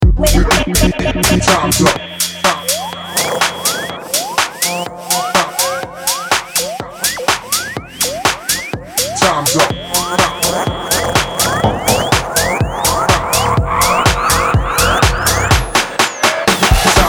Dirty House

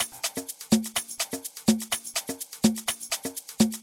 Drum 125bpm Groove

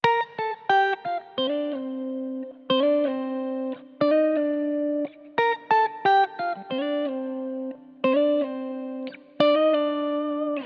Guitar melody g minor octaves 90bpm

